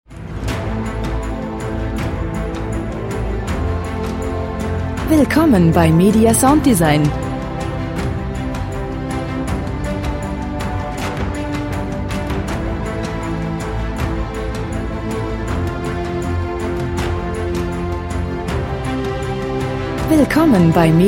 klassik

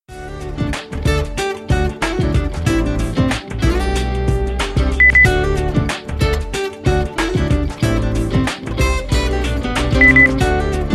gute laune

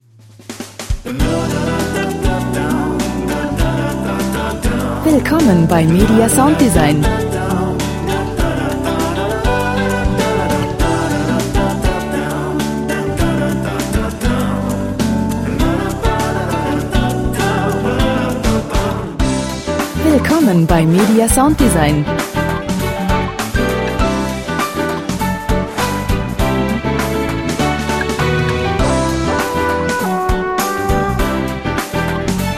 gesang schubidu

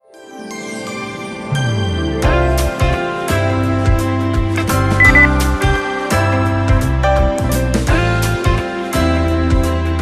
weihnachten, beep

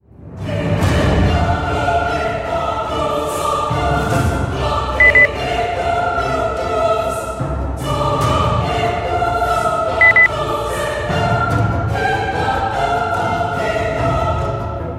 gesang

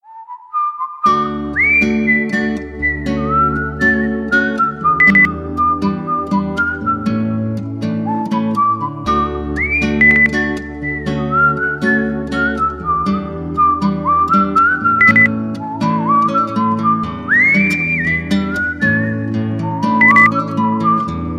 gute laune

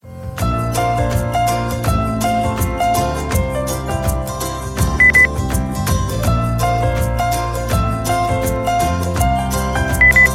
pop

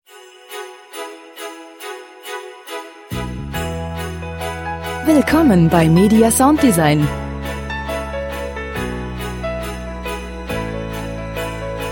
weihnachten schlittenfahrt

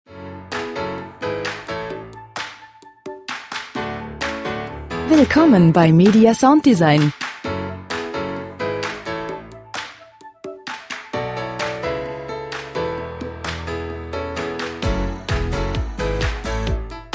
gute laune good vibes

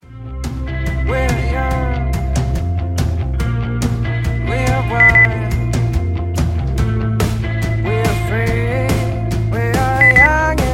gesang

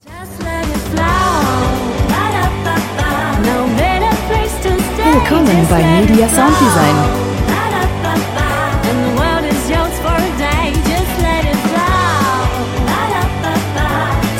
gesang flow

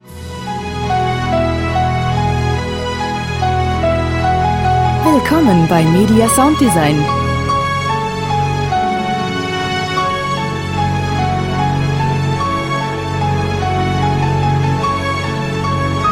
klassik

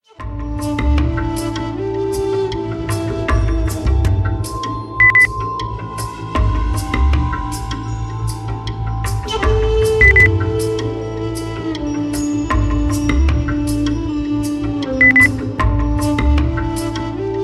himalaya beep

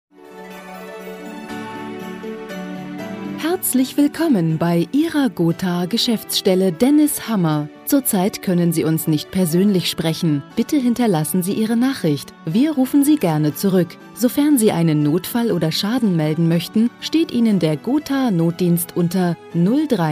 weihnachten; Weihnachtszauber

